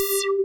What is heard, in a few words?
PULSE M BS, TECHNO; Pro-Rec - Synthsonic; Z1 TECHNO